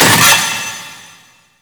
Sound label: Vaults Stake Gate; Gameplay; Warframe; Entrati